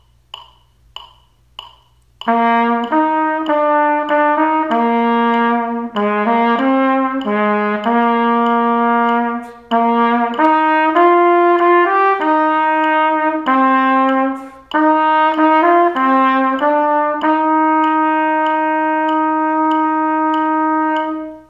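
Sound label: trumpet only, quarter note=96; Play-a-long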